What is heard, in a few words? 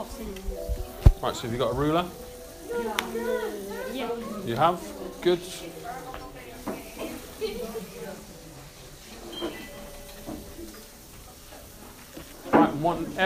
Music Classroom